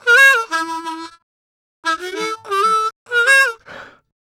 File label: Harm 098bpm A, Harmonica